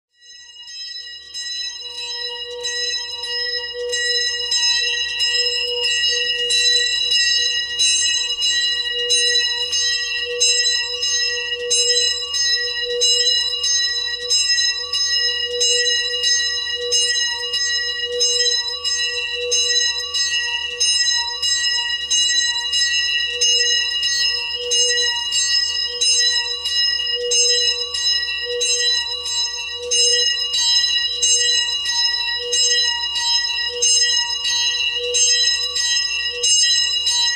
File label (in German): Glocken